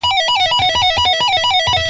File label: 20 notes, guitar